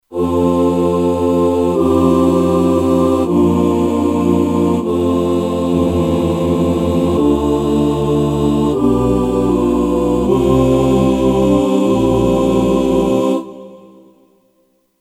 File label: Mixed Choir, Kurzweil K2500X; Factory Programs